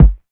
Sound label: kick